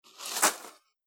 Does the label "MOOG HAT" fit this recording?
No